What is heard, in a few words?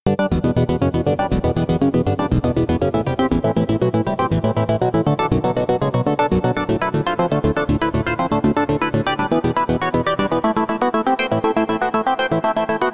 arp waves trip arp